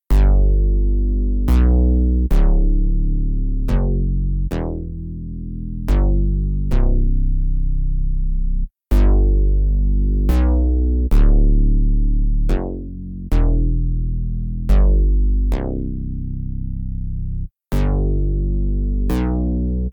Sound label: rhythm